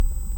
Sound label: Hydrophone